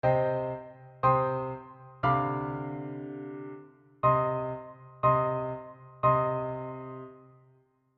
Klavierstuck abbozzo

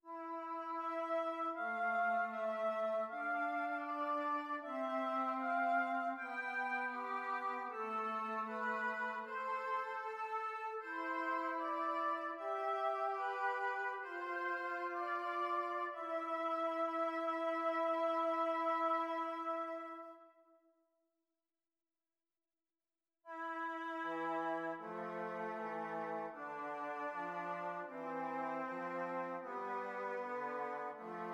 Zwei simmiger Contrapunkt; Ronge

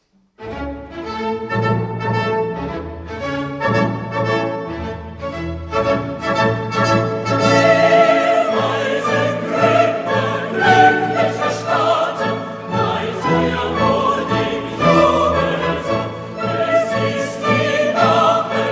für Chor und Orchester; Chor auf die verbundeten Fursten; Text von Carl Bernard

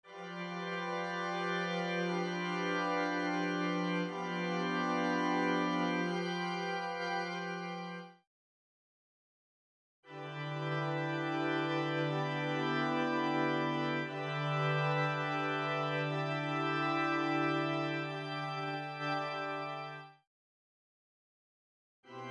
Dritte Verwechslung 642 Accord

